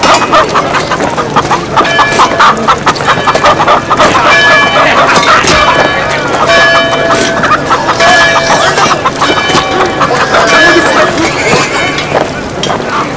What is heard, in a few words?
dog bark